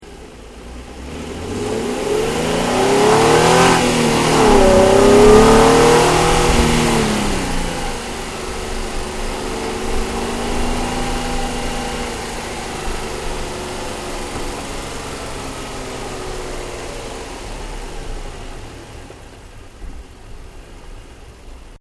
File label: CAR NISSAN 350Z ENGINE BAY ACCELERATION RAPID, manual sportscar